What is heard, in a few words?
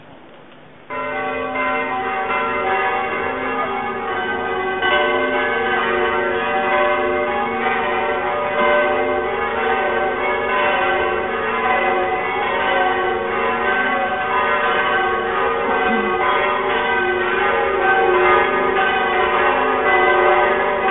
Bell